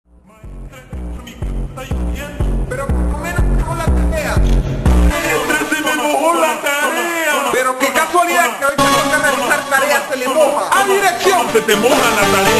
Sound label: Funk brazillian slowed